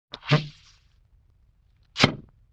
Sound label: Sloop Poink, Comedy Vocals; Vintage Cartoon